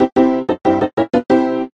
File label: 8bit piano lead